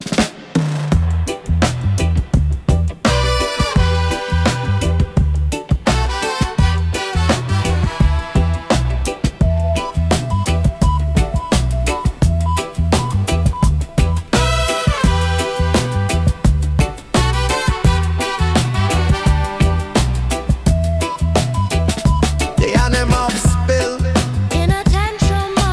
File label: Reggae